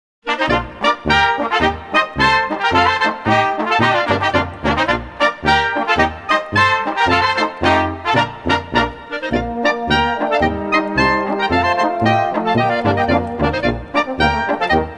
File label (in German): Volksmusik